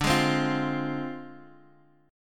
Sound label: D-Minor 7th Flat 5th, D, Guitar, Open G